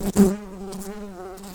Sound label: Humilis workers, bumblebees